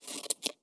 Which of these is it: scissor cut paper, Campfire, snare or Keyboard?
scissor cut paper